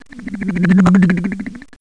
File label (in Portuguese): caneta; GEOGRAFIA